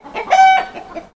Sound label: chicken; super admin